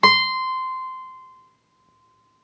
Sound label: string 1 fret 1